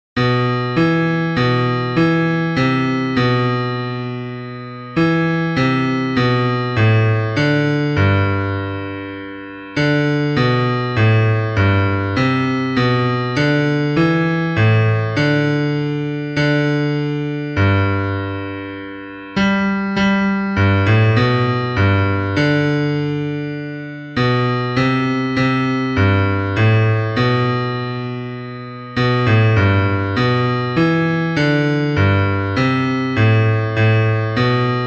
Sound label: Bass